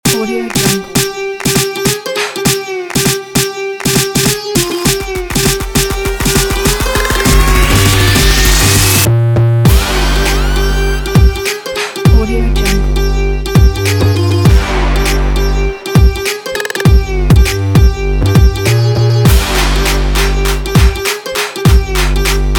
trap; dopecat

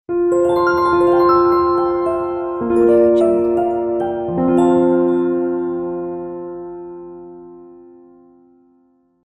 piano logo

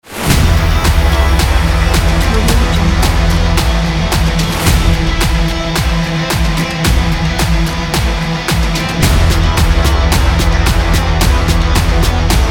sport rock driving

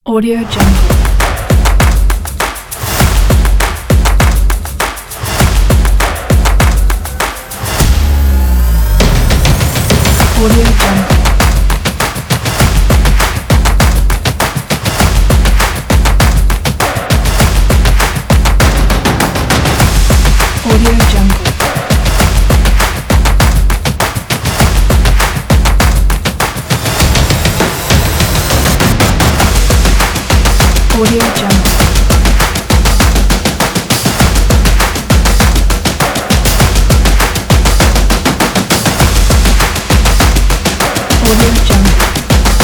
percussion; splintes

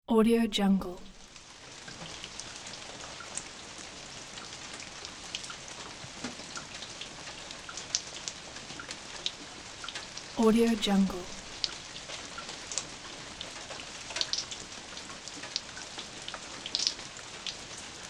soft rain

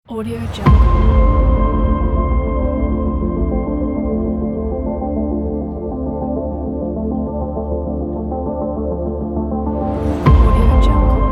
scifi 80s trailer; arcticfoxproduction

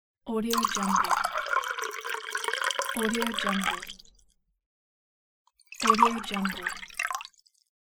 pouring glass of water

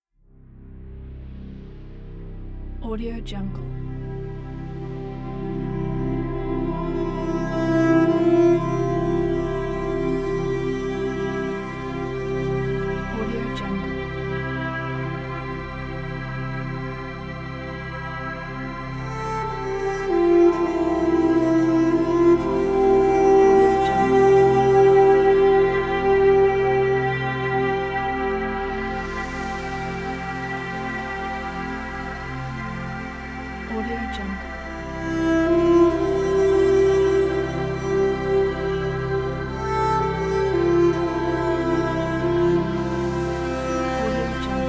summer sea cello soundscape

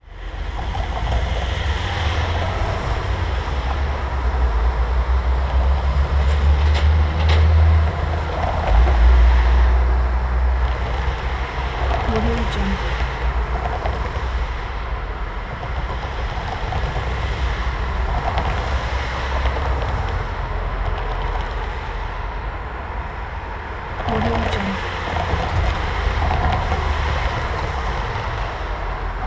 heavy car traffic at tram crossing

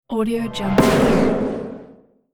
magic explosion spell

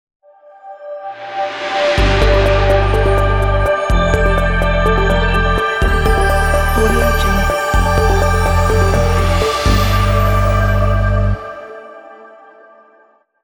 logo

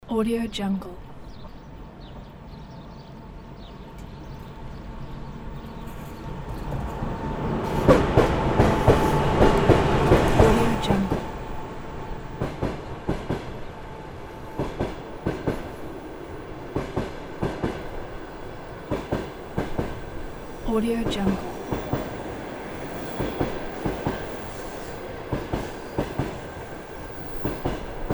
train passing by

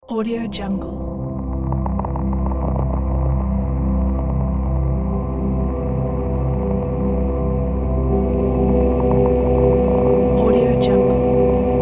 atmospheric abstract

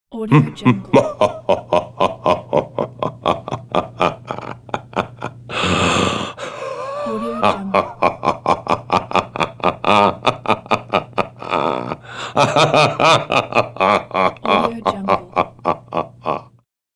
evil man laughing

